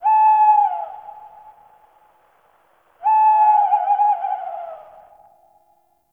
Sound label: Night Tree Critters; Ambience; Warframe; Eidolon Plains